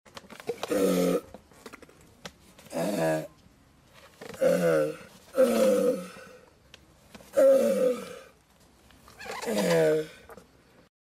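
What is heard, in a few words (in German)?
schildkröte; zootiere erkennen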